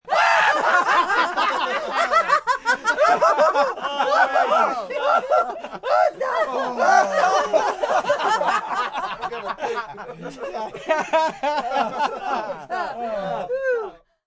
Group Laugh Loud, Laughing, group of 5-10 (30-something) friends, loud, belly laugh; human voice; Laughter